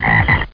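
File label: frog ribbit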